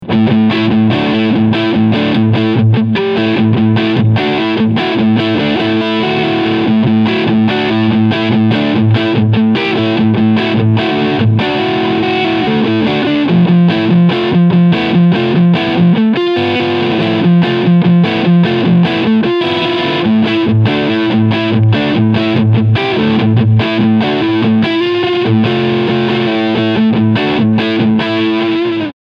Framus Stormbender Crunch beide PUs SC Modus; Framus Artist Line Devin

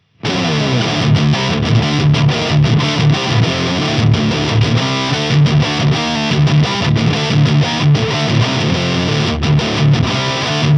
Boss HM-2w Boese

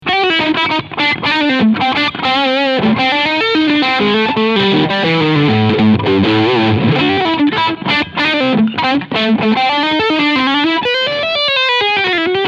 FGN Boundary Iliad Cherry Sunburst Leadsound Steg SC

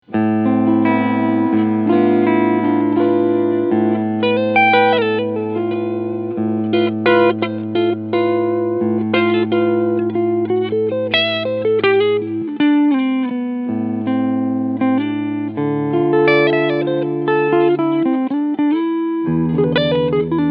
FGN Boundary Iliad Cherry Sunburst Cleansound Front Humbucker

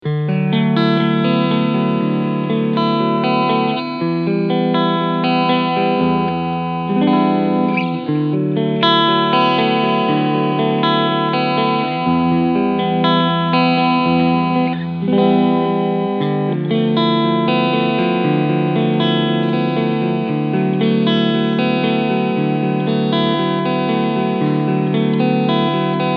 Harley Benton Dullahan FT 24 BKS Clean Sound beide PUs Singlecoil

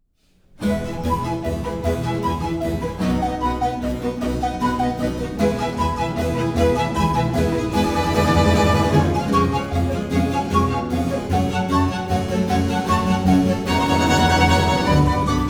Barock dry 96k

